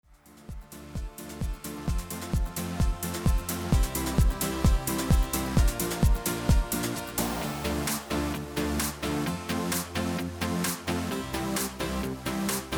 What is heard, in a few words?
Midi Yamaha; yamaha genos 2